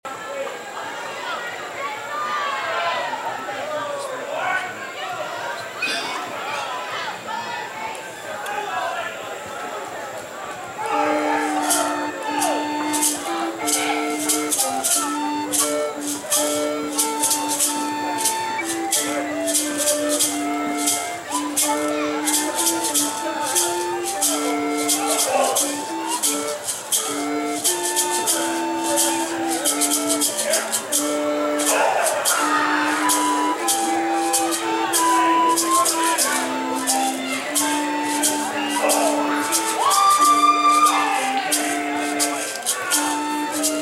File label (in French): flutes a bec